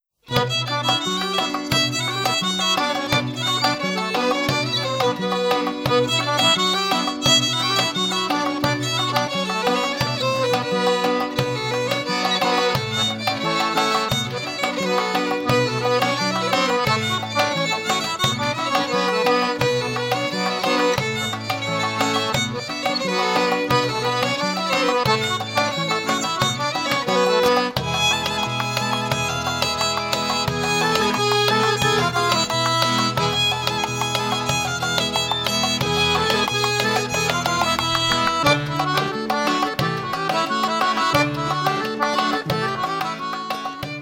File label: Syrtos